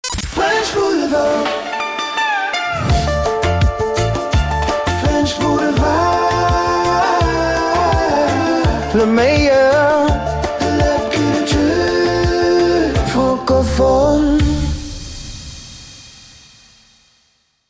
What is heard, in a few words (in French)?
French Jingle Chante; French Boulevard